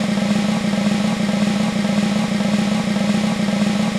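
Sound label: SNARE ROLL; Greytsounds VOL-1; ORCH PERC 3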